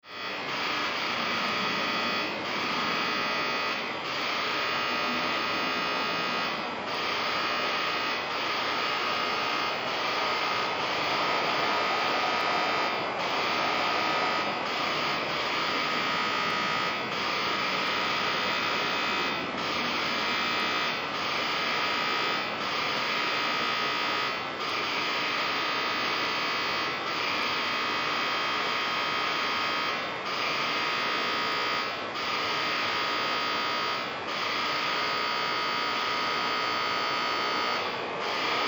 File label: dangerous atmosphere